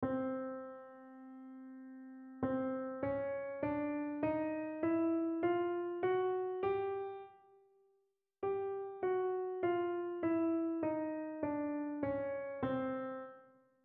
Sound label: C Chromatic Treble Clef; HIGH SCHOOL CHOIR; BERGEN COUNTY